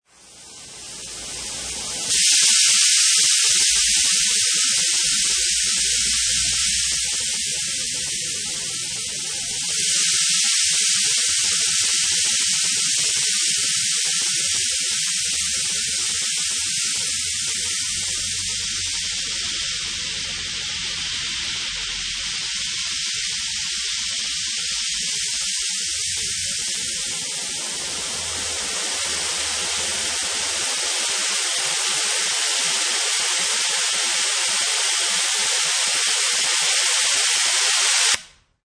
Blowpipe, starting, adjusting and controlling the flames, and then operating loudly; Air Pump Sound Effects; Lynne Music